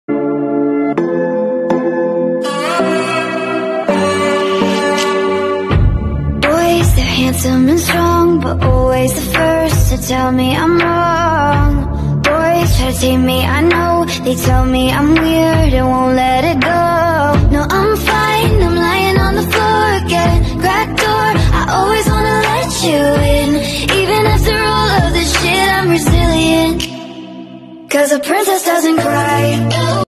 korean ringtone

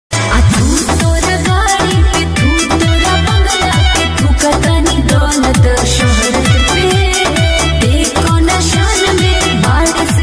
bhojpuri ringtone

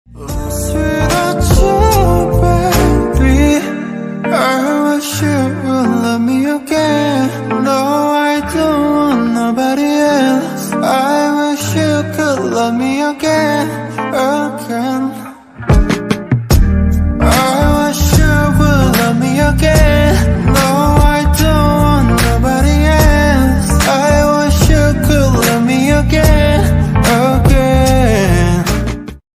korean ringtone

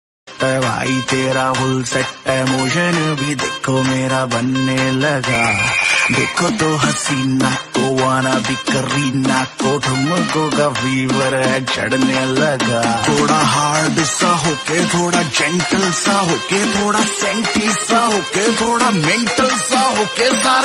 hindi rap ringtone

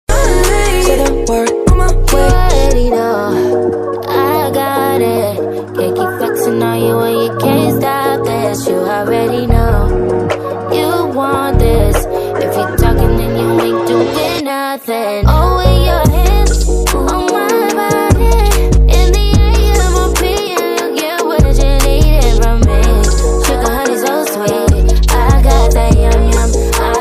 female, english ringtone

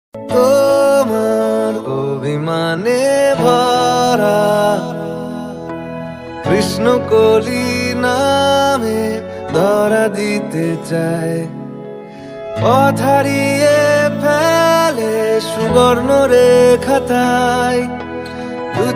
Bengali Most Heart Touching ringtone

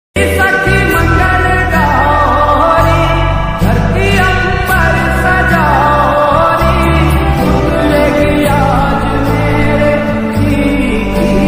lofi ringtone

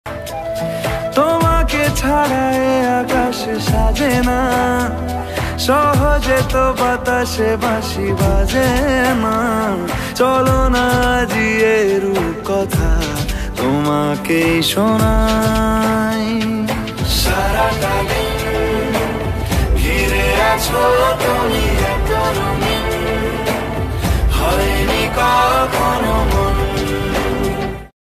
bengali ringtone

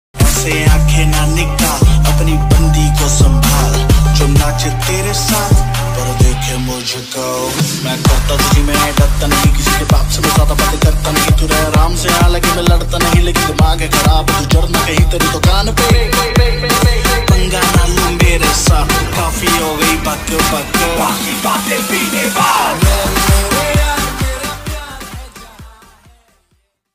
rap ringtone

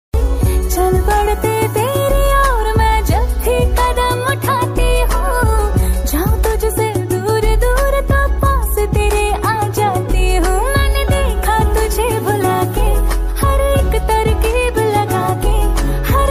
female version hindi ringtone

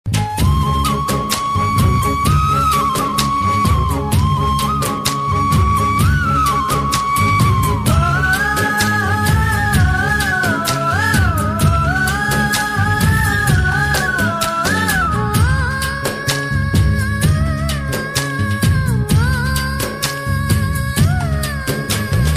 Flute BGM tamil ringtone

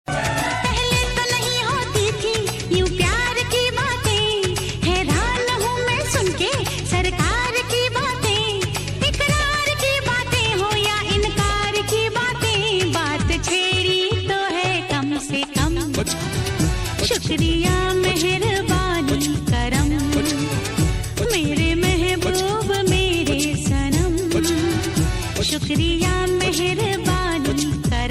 female ringtone

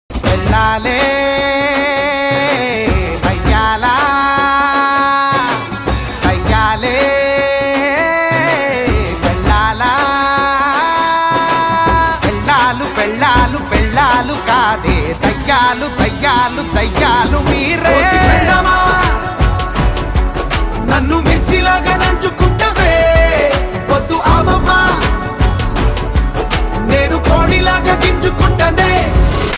telugu ringtone

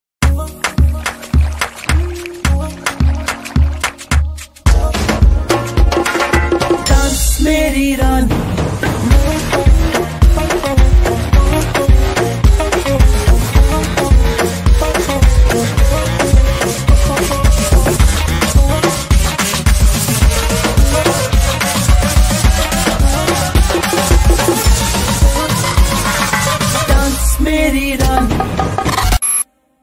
Instrumental ringtone

